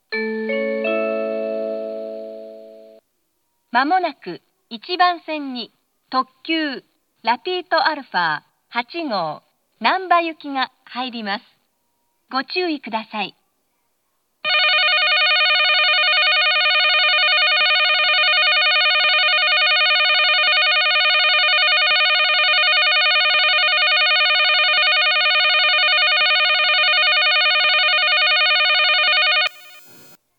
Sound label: limited express; rapito-alpha8; nankai-electric-railway; kansai-airport; namba